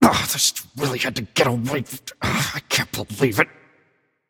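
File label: nonverbal grumble; voicepack; smite; merlin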